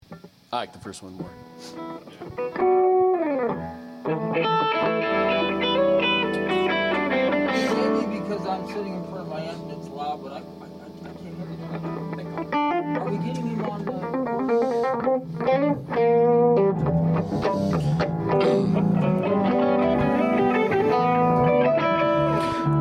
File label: Background Noise Mix